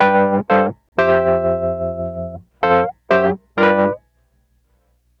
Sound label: Call And Response Wurly Ending, 70s Analog Funk; Sonic Foundry (Sony Creative Software); What it Is!